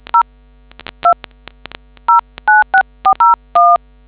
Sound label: dtmf